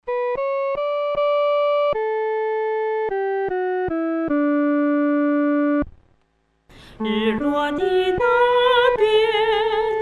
独唱（第一声）

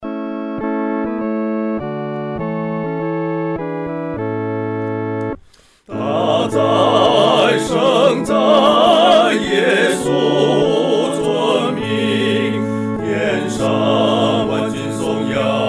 合唱 四声部; 大哉！圣哉！耶稣尊名

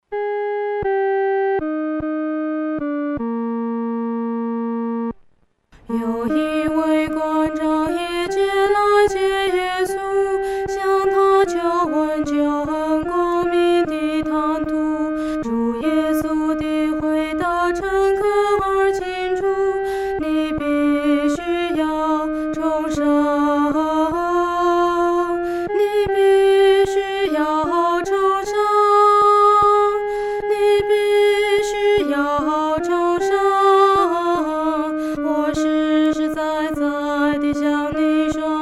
独唱 第二声; 你必须要重生

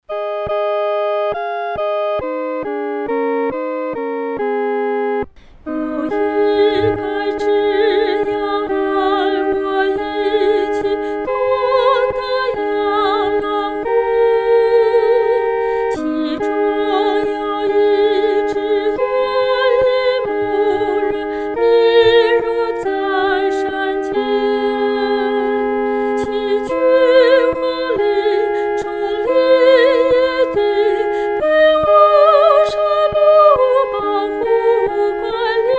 独唱 第一声

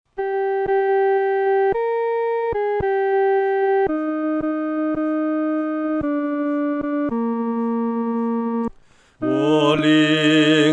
独唱 第二声

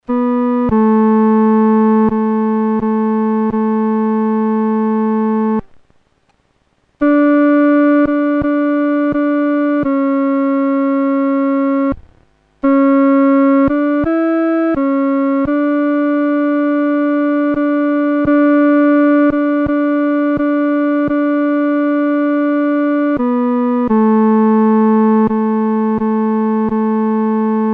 独奏 第二声; 垂听我祷告

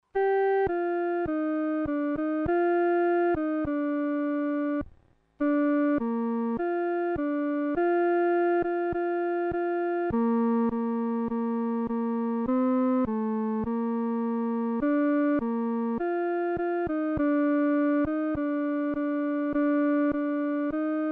独奏 第二声

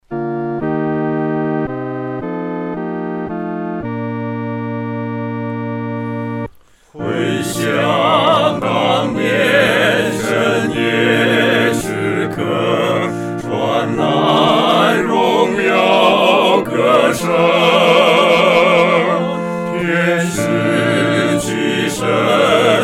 合唱（四声部）

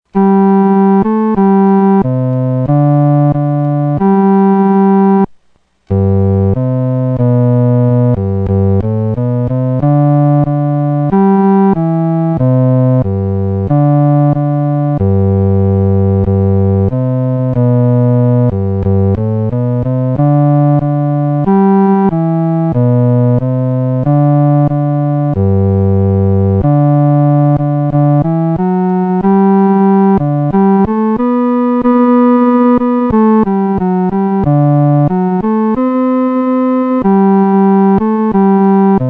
独奏 第四声

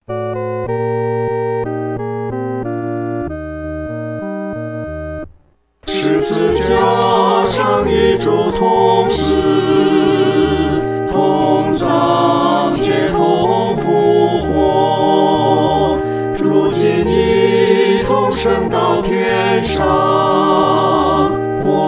合唱 男女二声

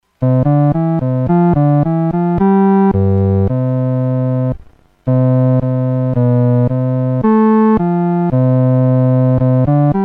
独奏 第四声; 基督今复活

